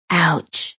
"Ouch" Female, Female pain; Sex

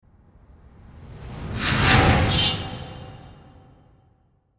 Whoosh Swirl Metallic Slice Dark; Production Element; Whooshes

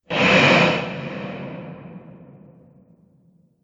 Fire Breathing Monster; Monster Sound Effects

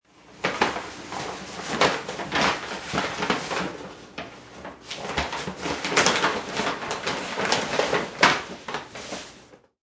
Grocery Bag Rustle, Grocery bag is rustled.; Rustling; Grocery Bag Rustles; Objects

